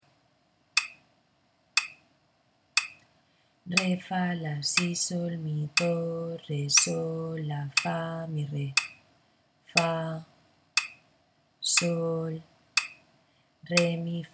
ritmo 6-4; Música sen compasear

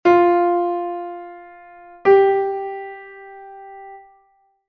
tono semitono fa sol; tones and semitones

